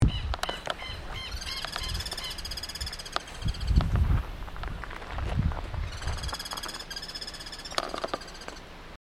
pirincho

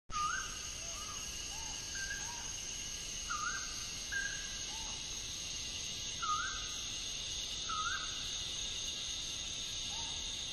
Anapero Castano

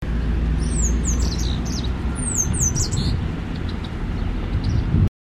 Totordo renegrido

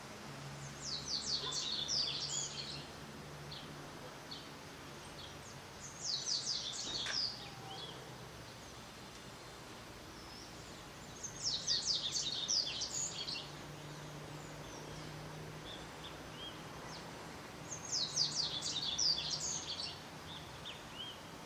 Corbatita Comun; SSJujuy

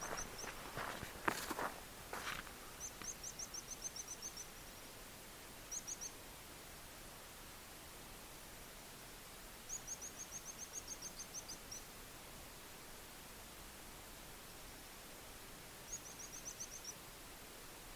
Coludito Copeton

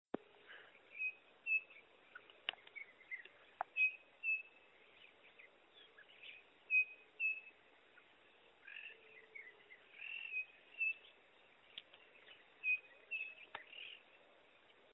CRESPIN; Las Piedras

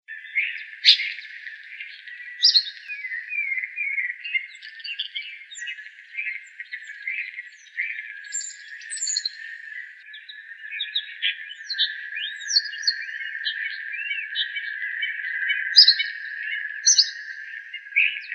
Estornino pinto

